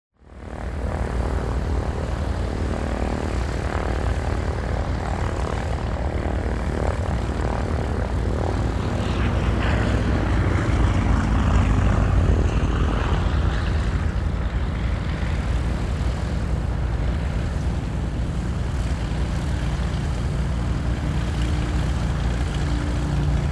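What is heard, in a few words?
aerodrome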